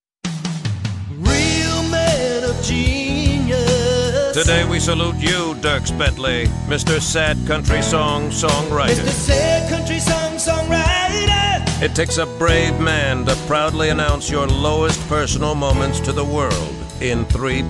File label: Sad Country Song; Bud Light Real Men of Genius; Mr Sad Country Song Song Writer